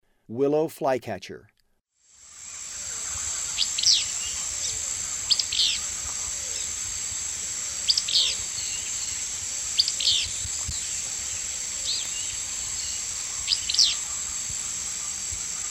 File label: Willow Flycatcher; S. America; Eastern Lowlands